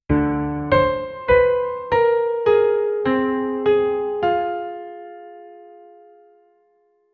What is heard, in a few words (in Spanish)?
un arreglo final que, melancholy